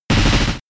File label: Sandstorm turn damage; Pokemon; gen 7; SUMO; USUM